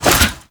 Rifle Swing; Warframe; Lasrian Soldiers; Weapons; Lasrian Lancer Rifle

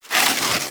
Armadillo Roll Snow

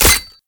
Pistol Alt Fire Charge Full; Weapons; Scaldra

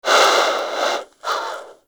Bird Snore; Hunting Critters; Sawgaw

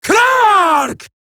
attack, female; en; Eximus Grineer; Battle Barks

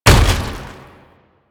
Step, Foley; Enemies; Warframe; Proto Infested; Babau

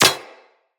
Bullet Impact Generic Metal; Weapons; Surface

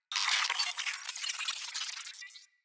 Space Drone Enemy Spotted; Enemies; Corpus

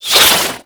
Projectile Whiz By B; Ultimate Abilities; Madurai; Warframe; Focus

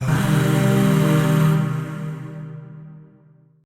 Shawzin Min Chord A; Warframe; Zariman; Void Shawzin; Chords

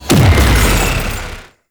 Brimstone Cast Burst; Uriel Brimstone; Warframe

